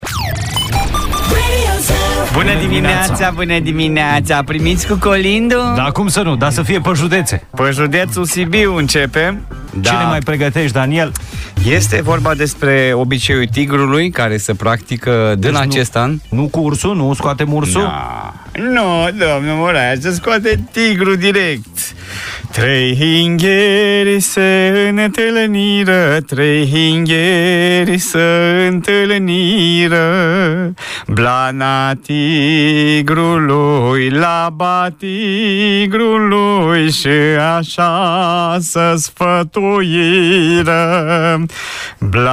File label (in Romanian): Colind judetul Sibiu; colindele pe județe